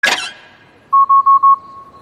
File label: CREAK AND BEEP; metallic